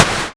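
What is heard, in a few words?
Snare reverb, Drumsynth Drums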